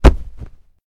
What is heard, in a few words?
bodyfall; civ13; civ14